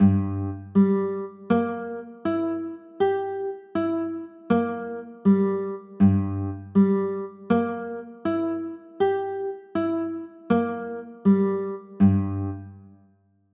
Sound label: g m6, chords